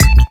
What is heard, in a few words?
KIK; PlatinumPhattCD1; E-MU Producer Series Vol. 8 – Platinum Phatt; Mo Phatt